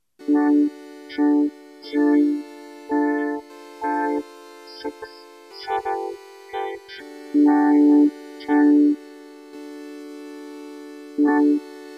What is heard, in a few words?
Vocorder